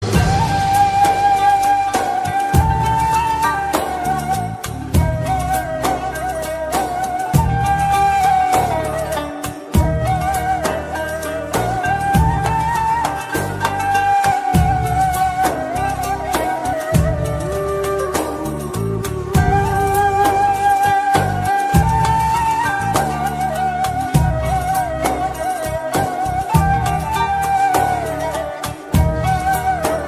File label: TURKISH NEY FLUTE MUSIC RINGTONE